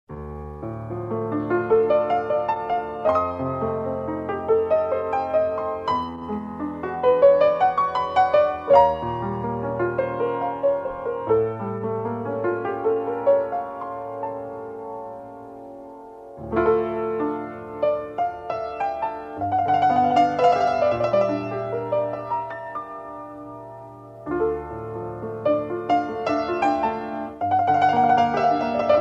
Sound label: classic piano melody; Electronica